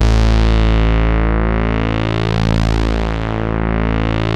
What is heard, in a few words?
PULZE SWEEP, BASSES; Masterbits; Vintage Voltage